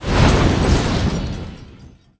explosion; flashbang

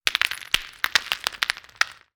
small rocks fall; earthquake

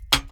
power switch on; electrics

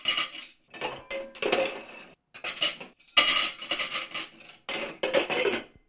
dishes cutlery; plates

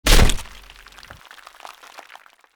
wood chop, axe

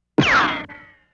cartoon shot

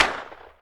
magnum shot, magnum exterior shot

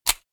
pistol reload

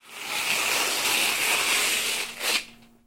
adhesive tape rip

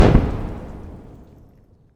explosion dirt; explosion bomb

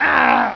human male scream

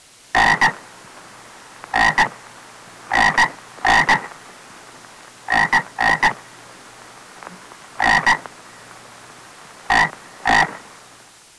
FROG CRO, frog croak

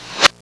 SWITCH OF; fast swoosh

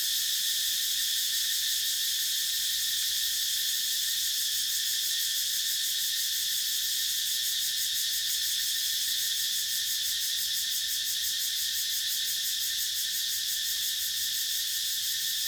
night forest; animals

